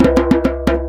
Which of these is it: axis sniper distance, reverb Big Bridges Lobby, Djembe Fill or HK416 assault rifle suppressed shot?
Djembe Fill